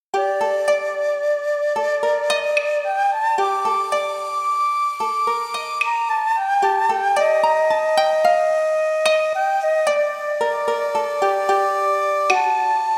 Japanese style music with a sad and cool koto and shakuhachi